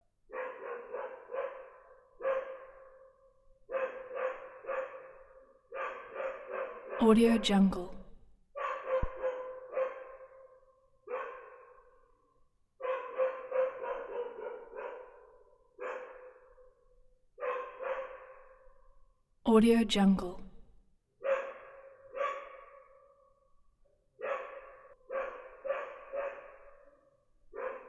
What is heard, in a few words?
dog barking